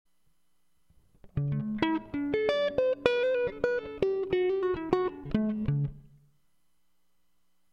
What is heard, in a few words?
Compressed Clean